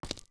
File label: Steps Stone; Walking on Stone; Young Link; zelda; OOT